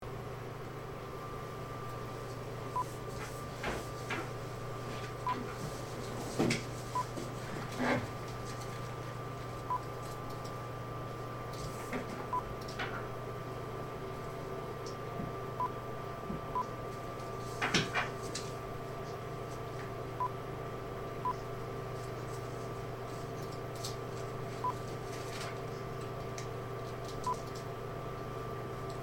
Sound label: Small Office Ambience